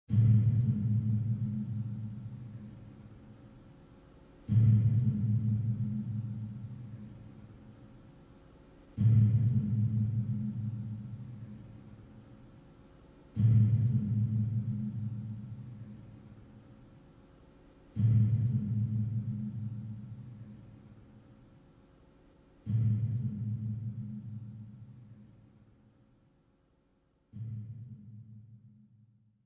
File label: cry bowl